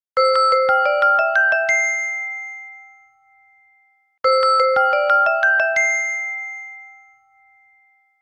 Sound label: galaxy watch blossom, zvuki samsung galaxy watch